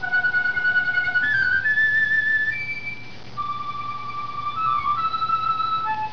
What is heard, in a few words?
piccolo